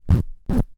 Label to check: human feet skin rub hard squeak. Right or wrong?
Right